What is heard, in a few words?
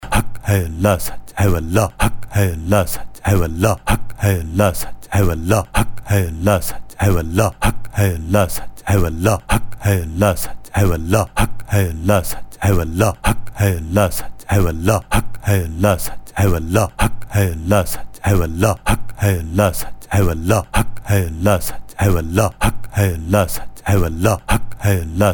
Haq Hy Allah Zikar Beat